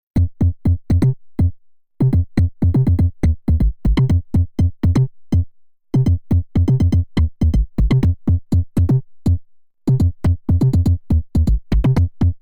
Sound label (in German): Jomox ModBase 09 MK2 Metal Noise und Bitmaske